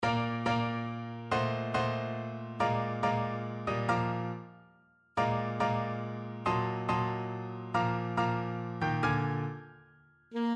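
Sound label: waltz, alto saxophone; black swan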